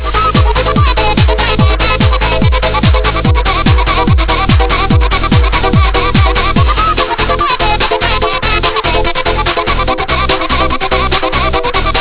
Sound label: pulse beats